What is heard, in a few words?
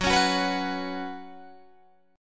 F sharp Suspended 4th, Guitar, Open G